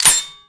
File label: casing eject